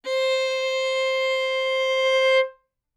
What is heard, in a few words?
Violin arco ff sul A C5; MIS Pitches - 2014; Strings